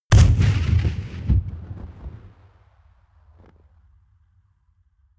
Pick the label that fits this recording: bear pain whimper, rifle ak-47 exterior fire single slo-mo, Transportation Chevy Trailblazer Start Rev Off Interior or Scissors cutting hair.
rifle ak-47 exterior fire single slo-mo